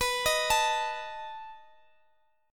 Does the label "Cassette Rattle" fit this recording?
No